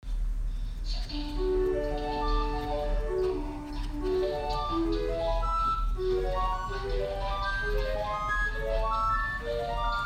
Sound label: Harmonic Flute